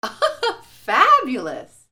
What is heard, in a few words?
PEOPLE